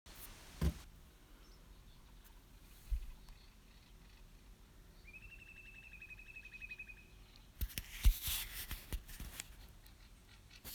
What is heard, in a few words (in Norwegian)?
fuglelyd